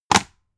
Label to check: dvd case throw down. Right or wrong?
Right